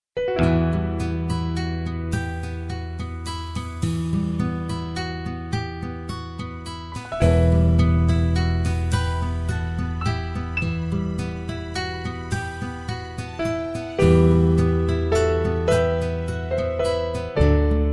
rojdestvenmuz

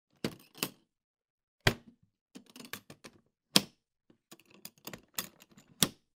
yashik s instrumentami

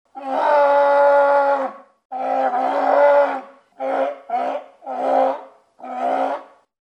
morskoj lev

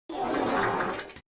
govno, zvuk govna

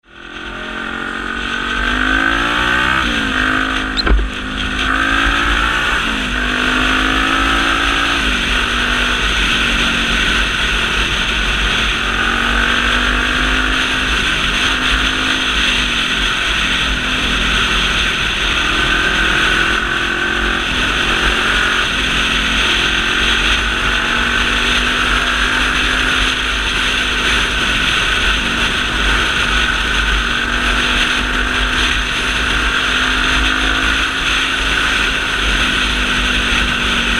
zvuk ezdy na motocykle shum vetra

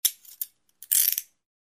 nadev naruchniki

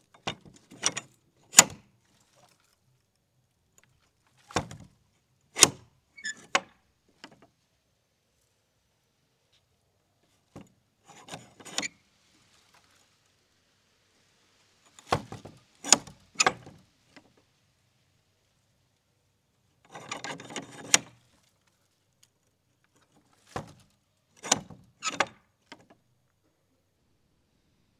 dvern zasov, Звук тюремного засова

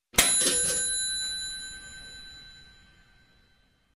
din tostera

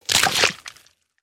zvuk skeleta

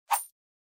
poimal monetu

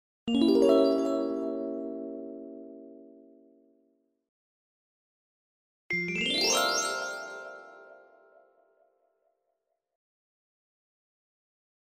varianta magii fei

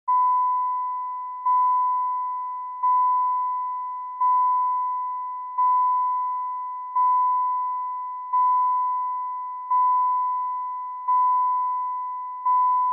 Signal ne pristegnutogo remnia avto

